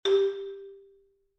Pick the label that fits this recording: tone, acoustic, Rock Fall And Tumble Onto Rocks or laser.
tone